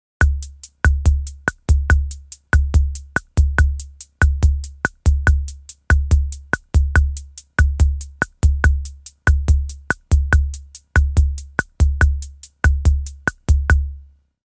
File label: RHYTHM BOSSA-NOVA